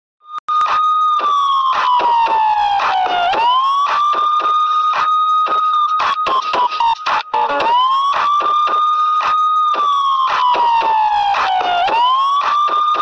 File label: Police Hooter Siren Ringtone; ringtones